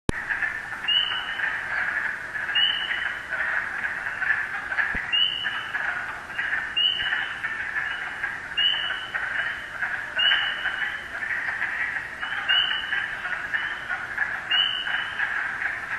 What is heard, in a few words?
First Peepers; Recorded in Rhode Island